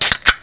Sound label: pop; Sound of a soda can being opened.